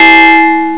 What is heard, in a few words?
DING; psion cd vol1